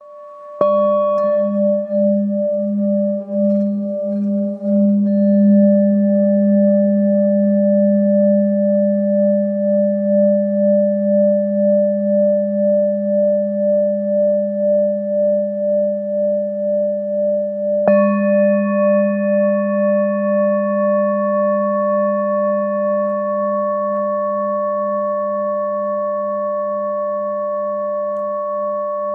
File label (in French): Bol bengale la